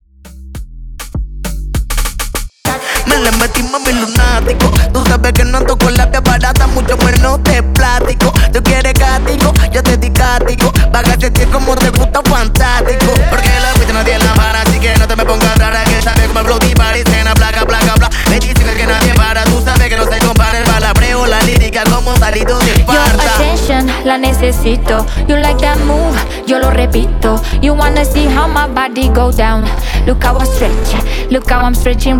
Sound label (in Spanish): Intro Verso 100 Bpm; esential tools